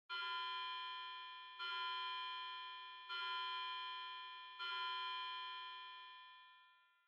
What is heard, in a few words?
Wall Clock Far Time Signal